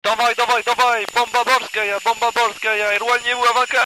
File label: bomb